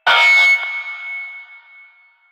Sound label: Mall Hub Stage Feedback; Ambience; Warframe; Nineteen Ninety Nine